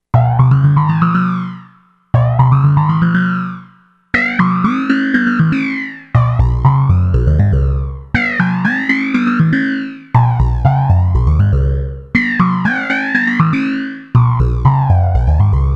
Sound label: flanger